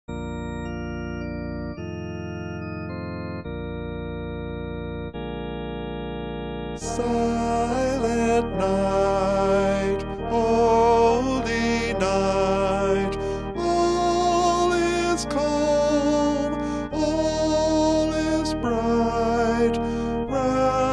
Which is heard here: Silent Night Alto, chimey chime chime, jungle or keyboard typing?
Silent Night Alto